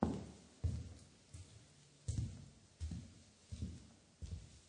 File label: Footsteps on wood floor